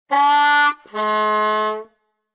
Efecto de sonido fallo

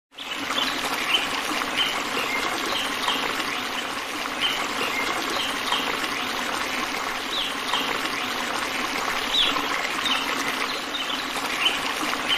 Relajarse Sonido NATURALEZA y CASCADA

